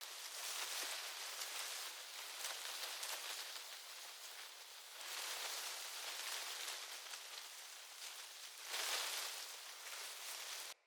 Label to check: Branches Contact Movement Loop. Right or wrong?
Right